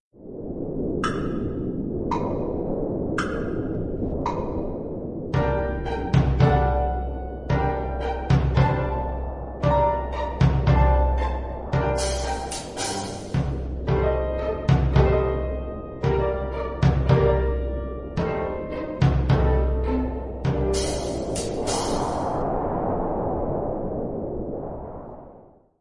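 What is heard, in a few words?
Winter night accompaniment; Hey Presto for viola bk 1 bronze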